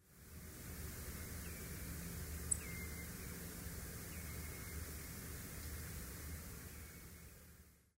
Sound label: song thrush nocmig